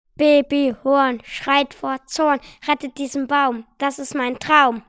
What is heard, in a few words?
Baby Horn